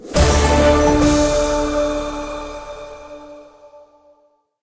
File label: medium Win; netent; magicportals